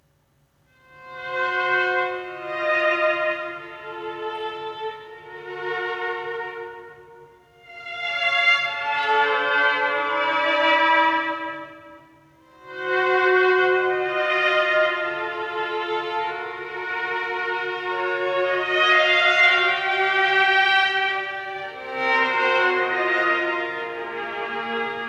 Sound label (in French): Suite en duo pour violon et alto; 5. les oiseaux eux auront des dents